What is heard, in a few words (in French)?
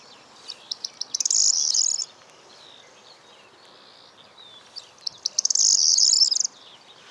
bruant proyer